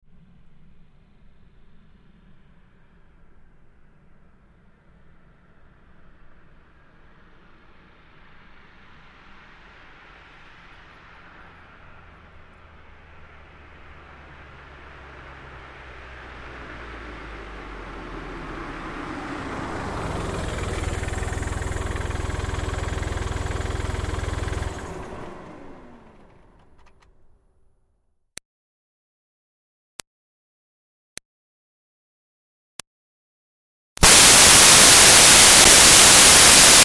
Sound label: Car Smart Car Up Stop Med; 2005 Smartcar Fortwo; Vehicles